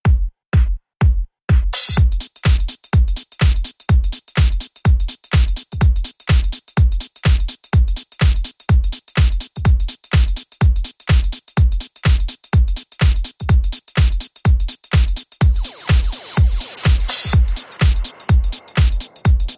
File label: Crib Beat